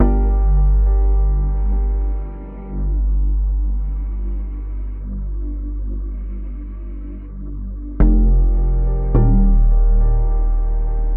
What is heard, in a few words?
Dropping cycles